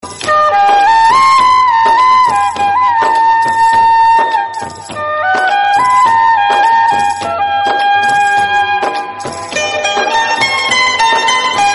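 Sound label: flute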